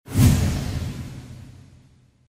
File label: WOOSH